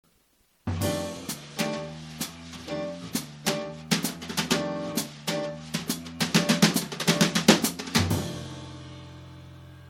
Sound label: a rock, brushes